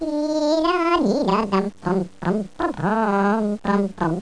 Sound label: chant; packard bel